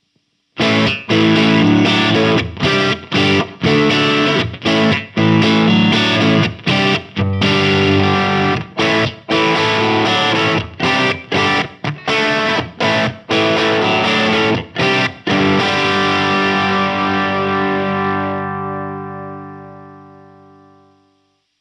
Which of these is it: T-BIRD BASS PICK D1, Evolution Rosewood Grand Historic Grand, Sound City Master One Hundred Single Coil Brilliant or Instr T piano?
Sound City Master One Hundred Single Coil Brilliant